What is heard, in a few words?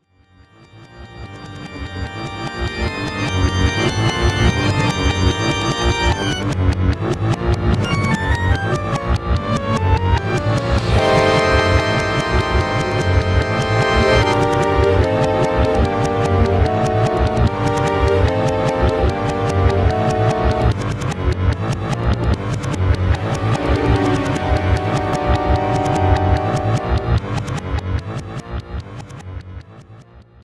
reversed; Camping 3 Boss Fight Reversed